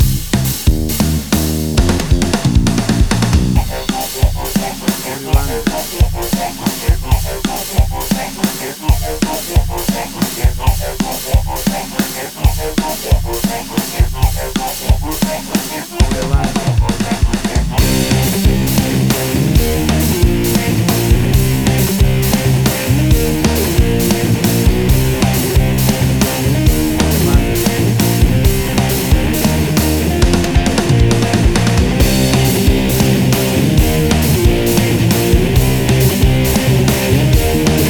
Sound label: Big Dirty Rock